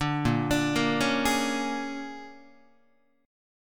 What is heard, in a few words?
Bb 7th Sharp 9th Bb, Guitar; Bb7#9; arpeggiated; JGuitar